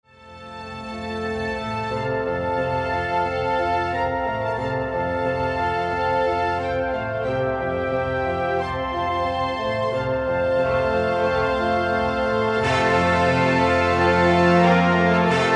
Piano Forte

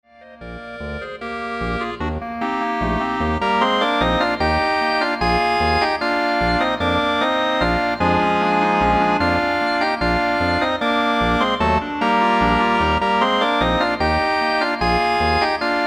Clarinet Ensemble

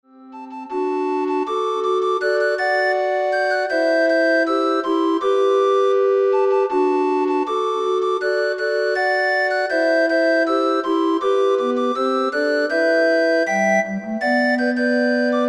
Recorder Ensemble

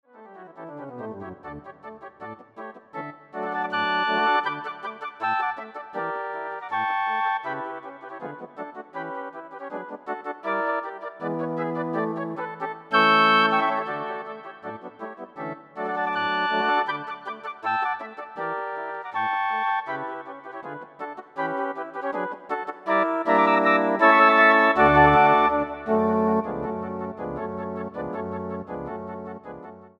Flute Ensemble

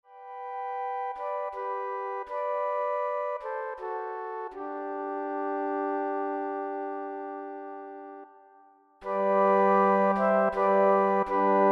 Flute Ensemble

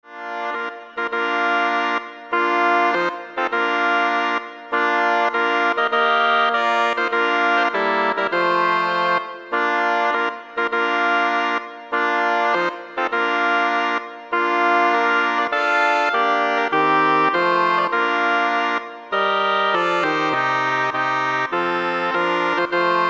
Double Reed Ensemble

